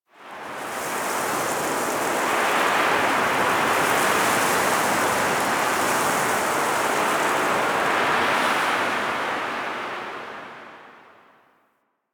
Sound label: Sandy Wind; Warframe; Man In The Wall